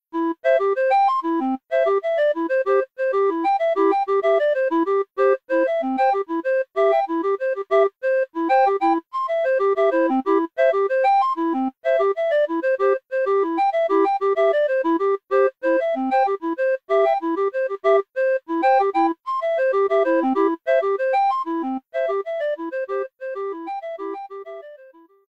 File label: Jingle; Beamish; Nintari